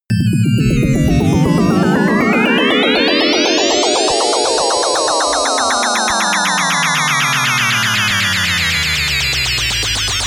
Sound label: atonal rhythm drop riser